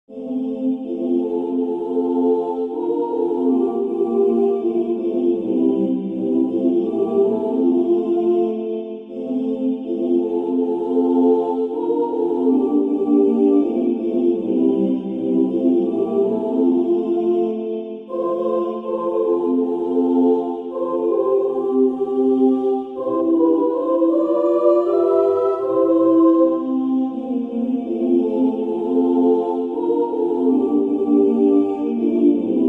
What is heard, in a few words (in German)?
erlaube mir bass